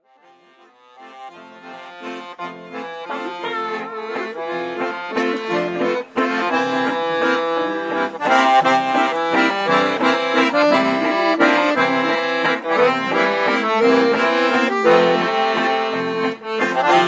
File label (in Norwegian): Melodi; Snekkerbua